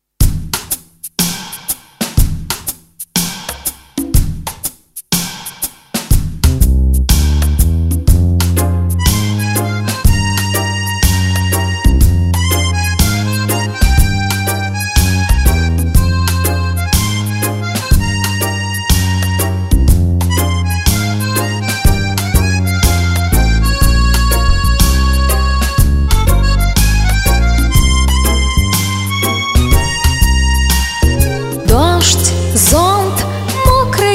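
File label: kvartet, quartet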